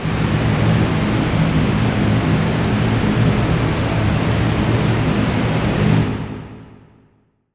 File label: Steampipe Ambiance C2